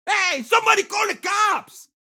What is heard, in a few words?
town human far; Blind Drive